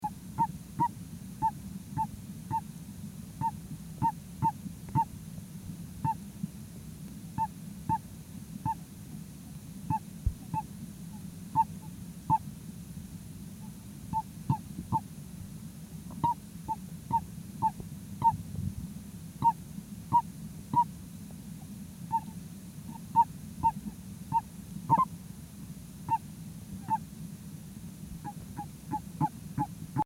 Bufo Torrenticola Is Calling

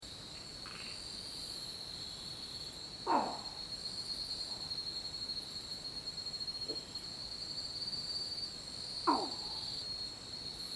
Babina Holsti Is Calling

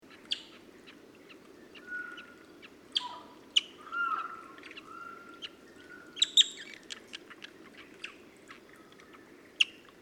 Odorrana Narina Is Calling

